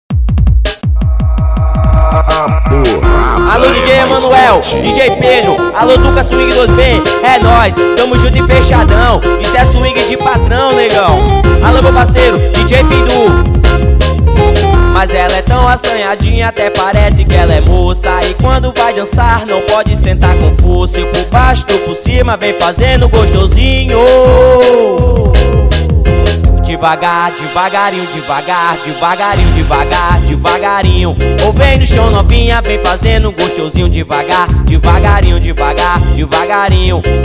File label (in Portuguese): swing